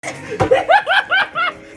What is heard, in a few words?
laugh